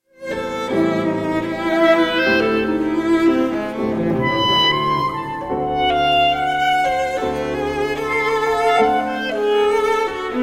trio in a minor for clarinet cello and piano op 114